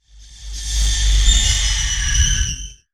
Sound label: Iron thumping and shriek